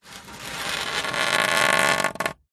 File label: foley polystyrene sheet flat thin scrape creak